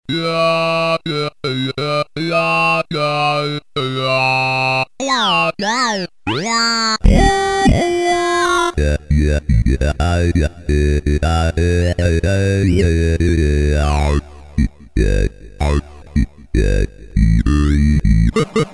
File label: vocoder